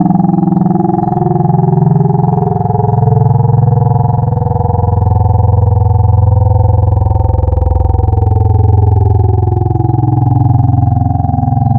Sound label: Vocal Formant Bot, Wavetables; Synthetic's Serum Collection; ESW Core Wavetables; Echo Sound Works Core Tables